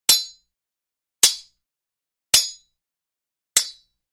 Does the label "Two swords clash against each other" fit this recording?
Yes